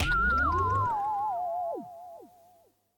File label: Count Rewind